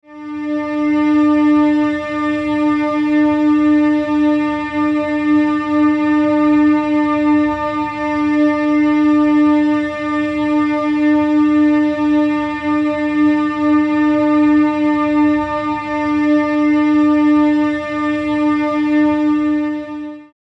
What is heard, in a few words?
Medium D, tones